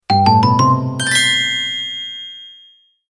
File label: game ending sound effect